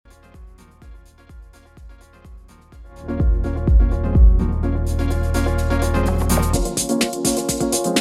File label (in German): Reloop RMX 10BT Alles Raus